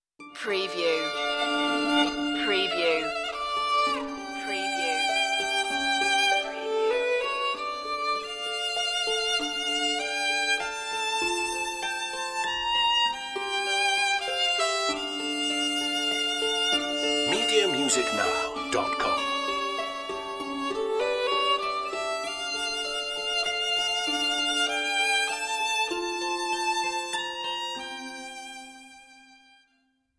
solo violin and harp

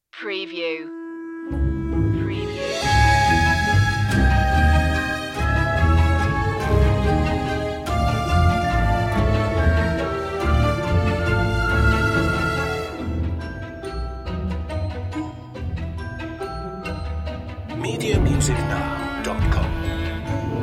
no choir

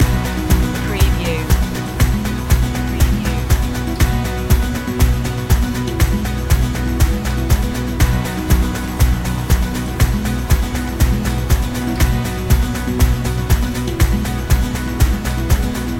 together we go loop

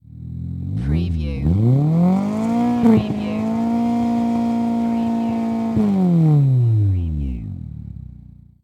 MITSUBISHI ECLIPSE EXHAUST LONG REV

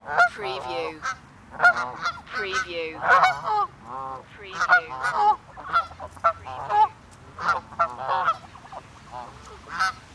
ducks quack

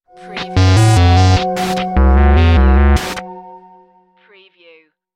Scifi Audio Logo Ident,Quirky,Random Notes

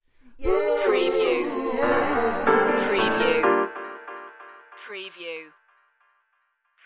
keen tweaks stinger

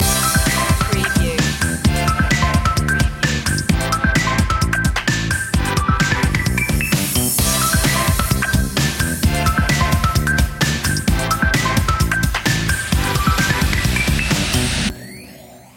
electro cool loop end; media music now

